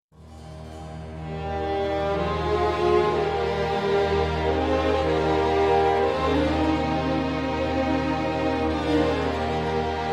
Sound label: Concerto Grosso In G Minor Musette; Handel; Concerto Grosso 6; Op. 6 6; HWV 324